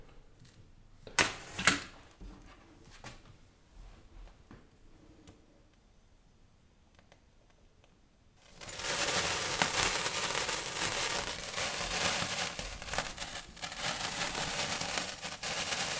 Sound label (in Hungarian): meggyujtas, csillagszoro; Perkupa